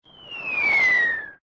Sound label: incoming whistle; toontown-classic